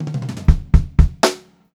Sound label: 121 FK FILL L, Funk-Groove Drumloops; USB Soundscan vol.38; AKAI; FUNKY